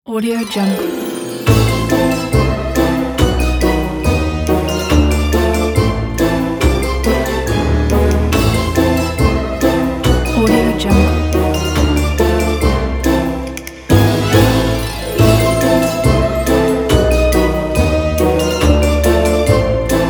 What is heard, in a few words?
halloween begins; mangoaudio